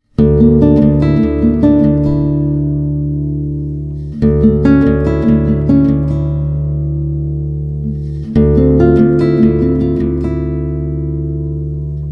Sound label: Deep Arp; usimmusic